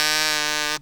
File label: Kazoo fa